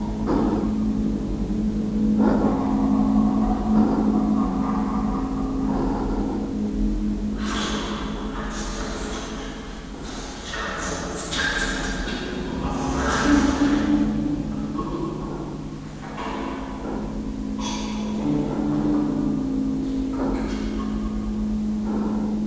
menace, ambience; quake2

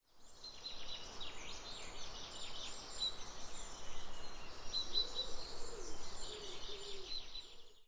bird; quake2

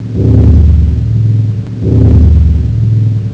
hum; quake2; weapons

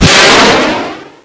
stinger; quake2

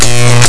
zap; quake2; weapons

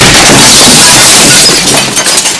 glass; quake2

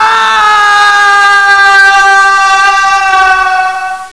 falling; quake2

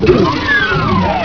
open, plats; quake2

